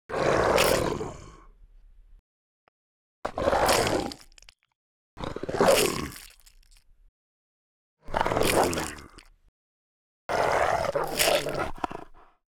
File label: Attack; Wolvajin